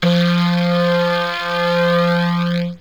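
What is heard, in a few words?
clarinet flanged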